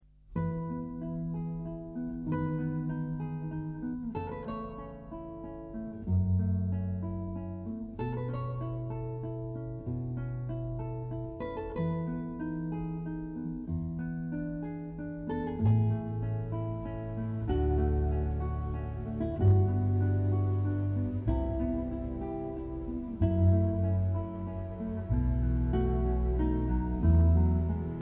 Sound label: delay min repeats min, guitar, pt2399; connection and testing